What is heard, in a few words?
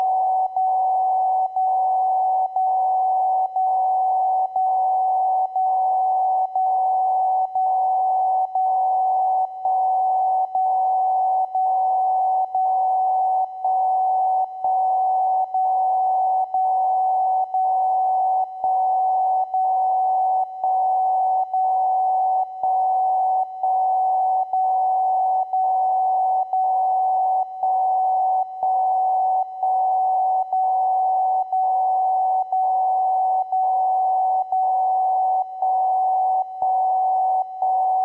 dcf77 vs 77.5, time signals; only dcf77